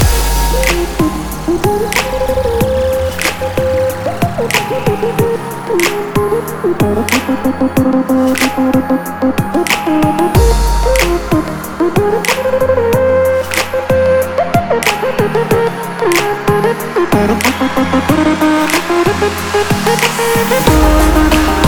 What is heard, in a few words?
Shadow Die Groove, EDM; 東方紅楼夢19